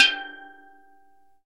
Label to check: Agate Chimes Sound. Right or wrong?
Wrong